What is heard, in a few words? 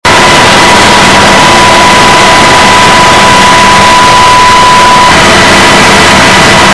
blackhawk